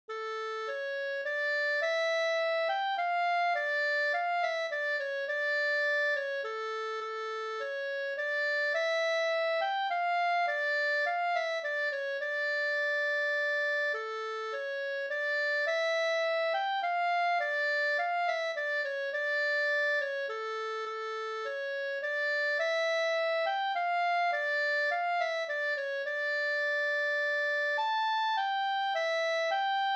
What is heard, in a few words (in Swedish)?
langdans d moll; fr Solleron